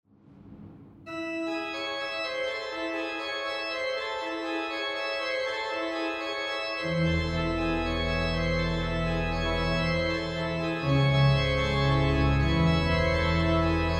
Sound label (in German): Orgelimprovisationen Psalm